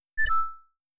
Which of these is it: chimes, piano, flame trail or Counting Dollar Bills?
chimes